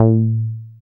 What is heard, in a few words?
Bass SH-101